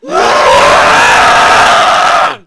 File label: scream, player; svencoop